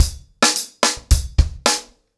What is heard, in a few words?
110BPM, ACOUSTIC DRUMS